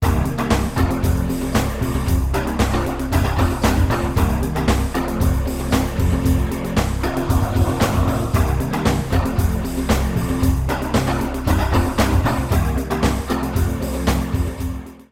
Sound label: funky, PNS above 500 Hz